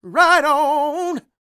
SING, Vocal Planet R&B & Dance; ILIO

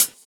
Hhat, HiHat; Equipped Music; Loungin House

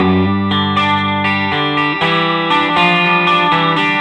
BYRDY LICK 4, Vintage Blues Guitar, 060; USB Soundscan vol.22; AKAI

